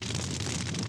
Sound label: fire; Politburo